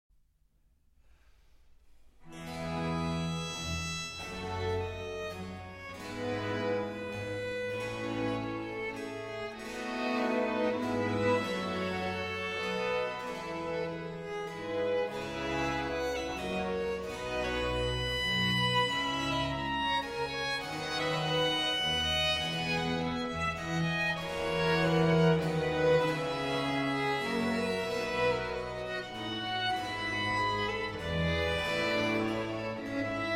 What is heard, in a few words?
Concerto For 2 Violins in D Minor, Largo ma non tanto; BWV 1043